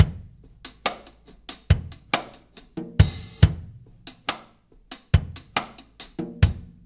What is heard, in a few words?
Earth Loop, Drum Tools, Laid Back